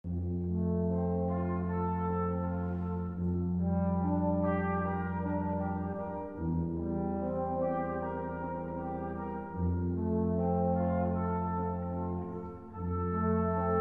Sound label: Quintet; Gounod Ave Maria